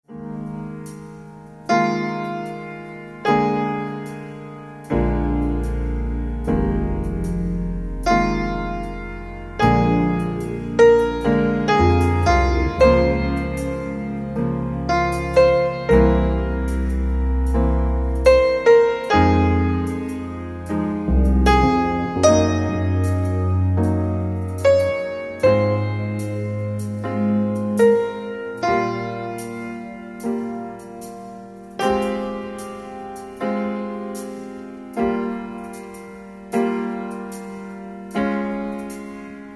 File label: piano studio